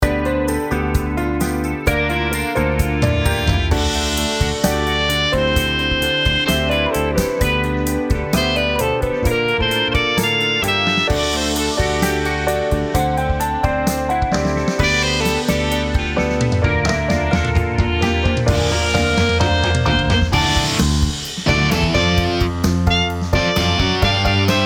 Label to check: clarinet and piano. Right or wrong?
Right